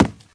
wood, footsteps, infected, walk; player; source engine; left 4 dead 2

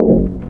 glass footsteps, infected walk

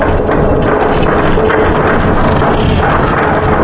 rack move, plats; Source Engine; bshift

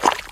mud footsteps, infected walk; player; source engine; left 4 dead 2

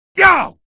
pain, male; video games; npc; source engine; episodic; episode 1

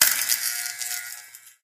light flicker end; Source Engine; Portal 2

